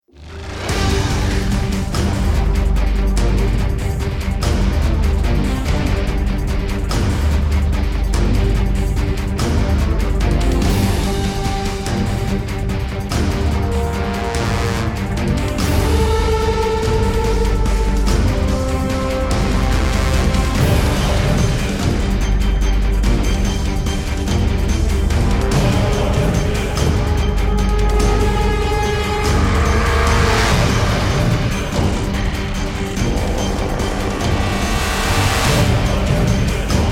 bomb planted; Counter Strike; CS2

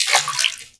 fs fm water, footsteps; Portal 2

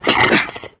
run grunt, headcrab reviver

